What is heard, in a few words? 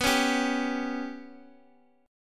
B Suspended 2nd Sharp 5th down Guitar Open G